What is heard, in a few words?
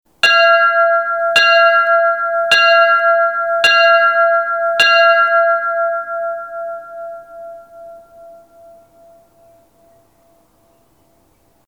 Bell ringing